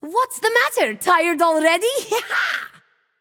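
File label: "yay", female; smite; voicepack; tiamat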